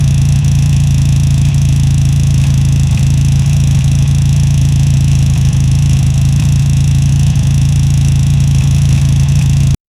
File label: loopable small motorbike